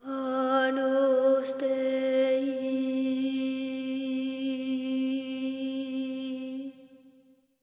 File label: AGNUS BOY, BOY PHRAS, Symphony of Voices; Spectrasonics